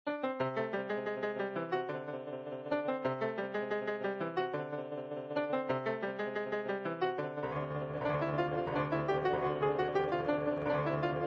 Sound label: piano primo